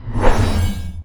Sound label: tackle; war3source